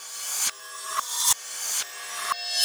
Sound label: Cymbol Particle; Particles